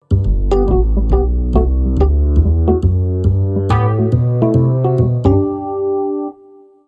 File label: Sting